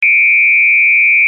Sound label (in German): triller